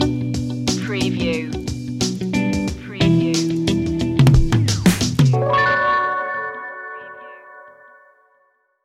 slow funk reggae stinger

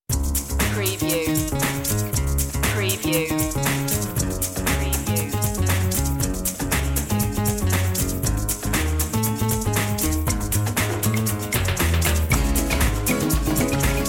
santiago swing

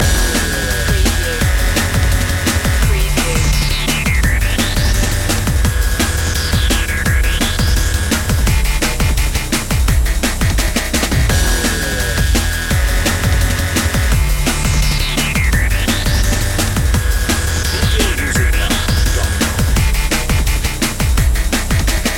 dnb

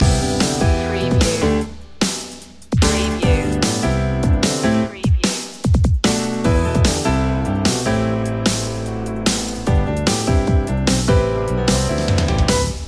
piano pop loop

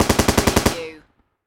Machine Gun M240B Long Burst Close Perspective

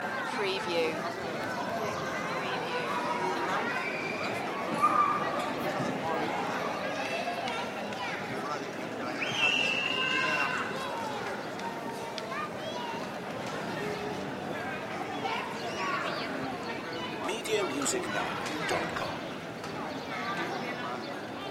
city square barcelona loop

